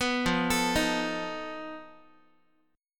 F 7th Flat 5th F down Guitar; F7b5; arpeggiated; 14,13,12,13,x,x

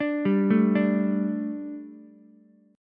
A 7th Suspended 4th, A, down, Ukulele; A7sus4; arpeggiated; JGuitar

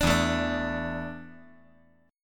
E 7th Suspended 4th E down 5G 4C 5E 5A

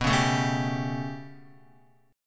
B 7th Suspended 2nd Sharp 5th down Guitar, chord; B7sus2#5 strummed; JGuitar

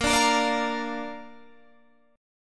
A sharp Major

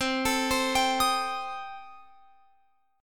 C Minor 6th Guitar Open F, chord; Cm6 arpeggiated

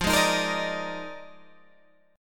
F Minor 13th, Guitar Open G

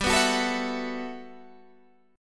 B 7th Suspended 4th G; strummed

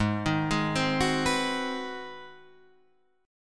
Ab 7th Sharp 9th Guitar Open D, chord

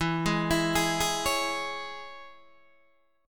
E 6th, down, Guitar, Open D

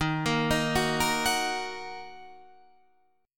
D sharp Minor D sharp down; arpeggiated; Standard; JGuitar

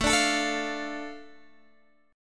A Suspended 4th Sharp 5th down Guitar Open D

